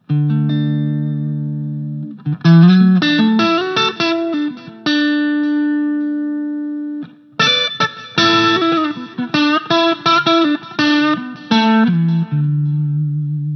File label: Twin Reverb mit OD Gain 25; Mooer SD30i